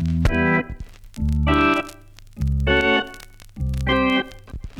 keys

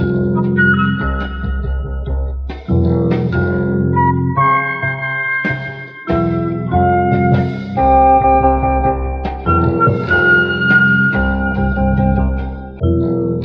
flute, Loops

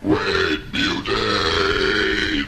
burped; Voice drops

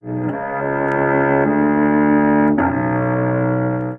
strings, Sad Loops

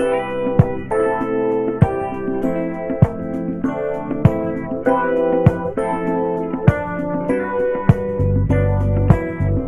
keys, phonky loops

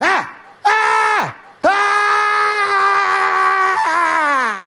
scream

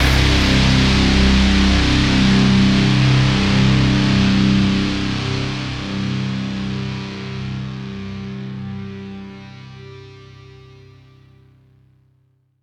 heavy distortion, Guitar; Notes

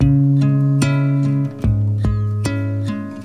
guitar spanish, Sad; Loops

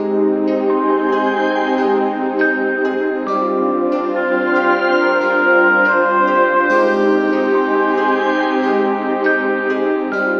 keys, Loops, Sad

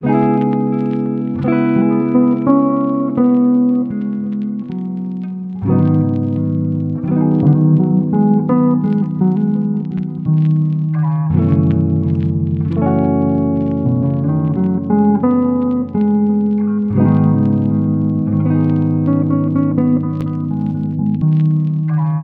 guitar, Loops

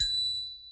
toon slaps, Percussion; Misc Percussion

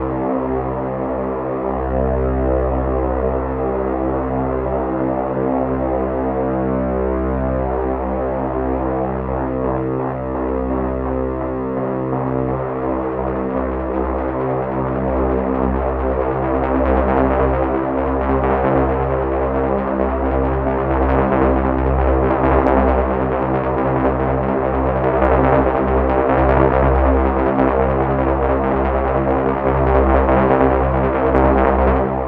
growing vibrations, Electronic Loops

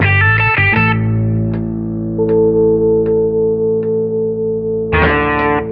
guitar

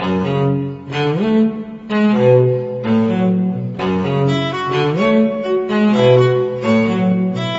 strings sadness, Sad Loops

